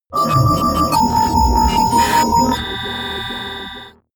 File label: sci-fi jingle negative